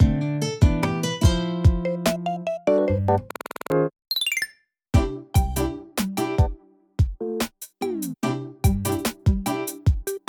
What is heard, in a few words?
BGM Menu; Clubhouse Games: 51 Worldwide Classics